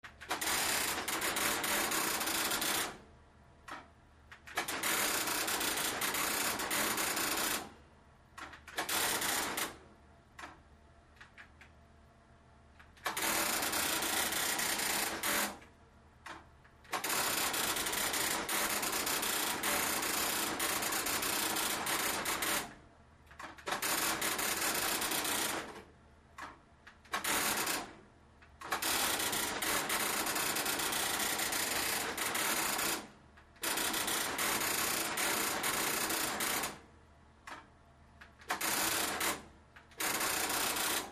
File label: Printer Old Large Plo, printers